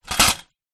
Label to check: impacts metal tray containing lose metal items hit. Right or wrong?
Right